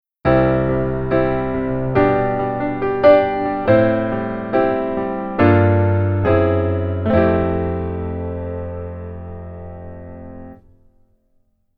Keyboard Velo

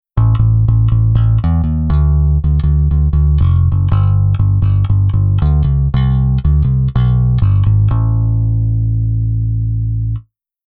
Bass Qua Off

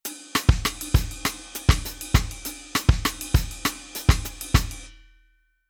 Rhythm Latin

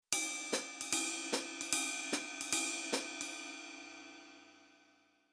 Rhythm 2beat Ride

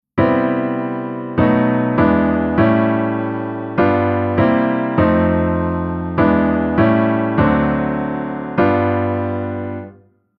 Chord Reharmo

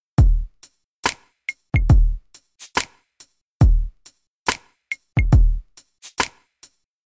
Rhythm RnB

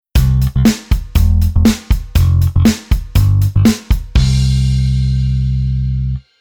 Bass Arr Rhythm Kick Hokan

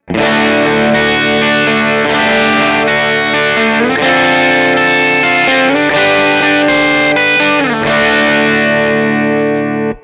guitar AC Box

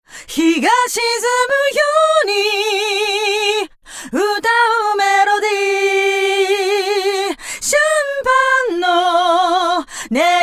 Chorus Flanger Voice 6

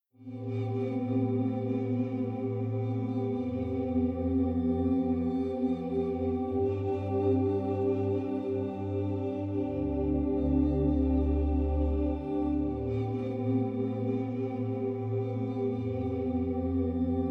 Future Bass Chord Pad Main EQ On

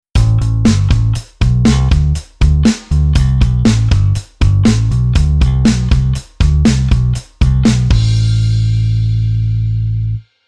Bass

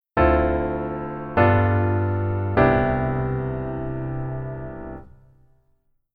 keyboard voicing form

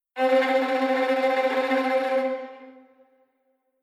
tremolo

